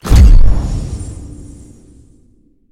button launch; patcher

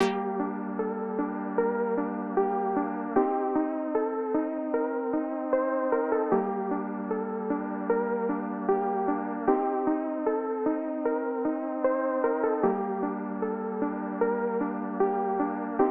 Synth

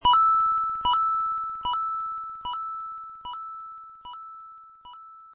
smb coin

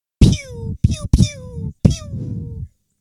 pew pew pew

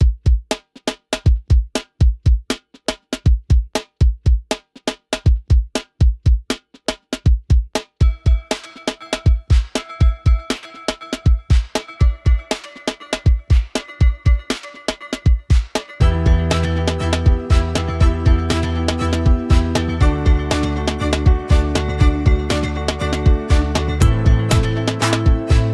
Backing Track

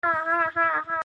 hahah

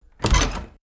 metal door handle opened; joshua chivers

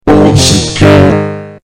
OLD SOUP CAN

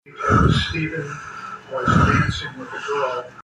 BREATHING

